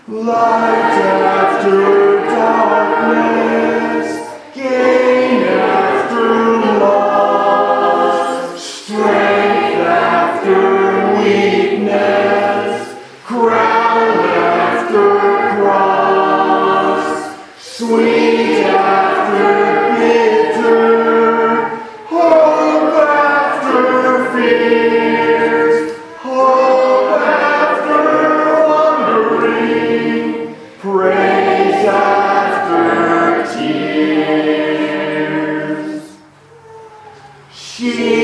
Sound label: singing class, hymns; light after darkness